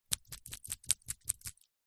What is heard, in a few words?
dog small scratch itself collar rattle; zapsplat